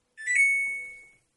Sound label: samsung washing machine notification